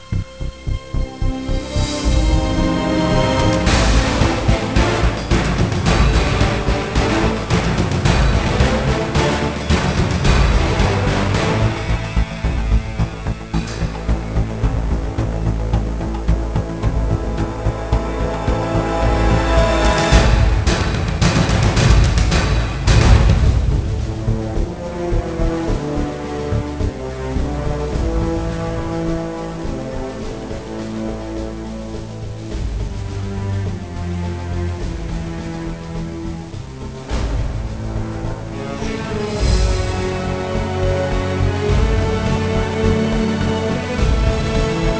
rock; cstrike

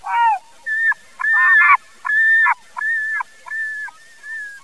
gull; ambience

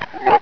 idle, zombie

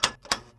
pkm belt ins; firearms

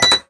hud on

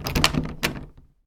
locked, doors; svencoop

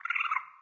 random jungle; svencoop; underrain

